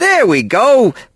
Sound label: LAUGH; BattleSNDS